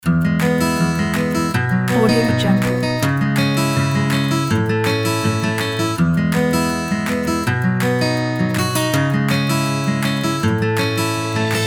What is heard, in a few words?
happy